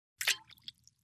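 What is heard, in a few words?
short splash something into a glass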